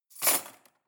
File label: cutlery cake forks drawer place hard